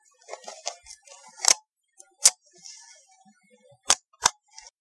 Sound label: instamatic